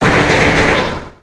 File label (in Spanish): Grito de Mr. Rime